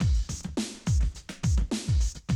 Classic DrumLoops

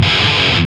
GTR; Roland - LA Composer vol. 1

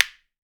WOOD SPOONS; Jew's Harp & Spoons

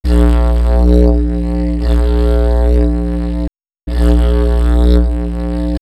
Didgerido; X-Static Goldmine; backtothe70's

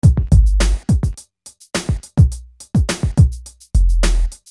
Beat

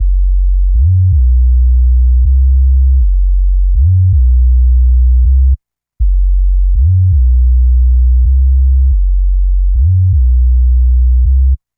Spooksub Bass; Sonic Foundry (Sony Creative Software); Knutrix Drum & Bass

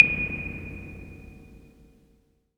CLAVE, 808; Polyend; Stazma & Concrete Collage; Concrete Collage Drum Kit; Spring808

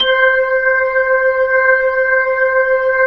B-3 Jazz; Keyboards of The 60's and 70's

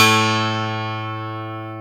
12 STR A2, PIANO; AKAI S-Series CD-ROM Sound Library VOL-8

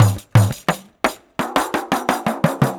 Pandeiro, 4-4 Drum Loops, Perc Loops; Drum Tools

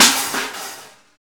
FX CAN, industrial; Roland - LA Composer vol. 1

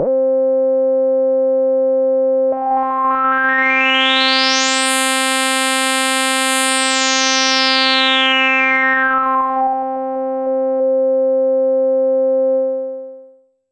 C2, SynthLine; 90 s Sample CDs; NiceBeats; SynthLine VOL-21

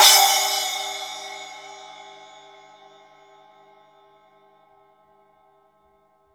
crash; East Collexion; Drum 1 Dry

